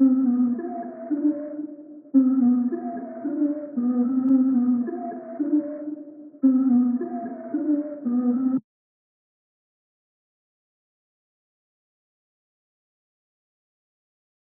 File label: 112 BPM A Min Low Keys, Loop Stems; Cymatics - 2022 Melody USB Expansion; Blue Pt 2